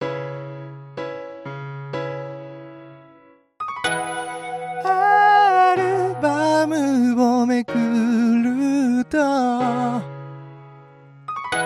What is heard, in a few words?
pad